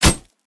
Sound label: Whistler Attack Spear Hit; we are the dwarves gamerip 2016